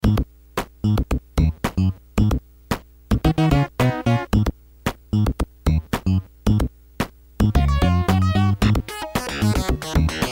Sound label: Funkotronic Beat